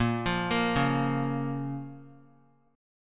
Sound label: Bb Minor; arpeggiated; JGuitar